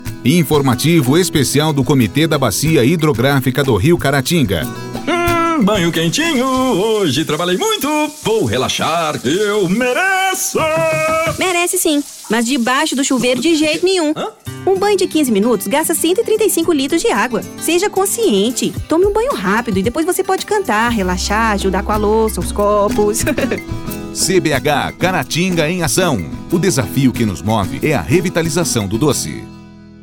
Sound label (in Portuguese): Cantando no chuveiro